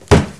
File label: shield hit; cstrike; snowwars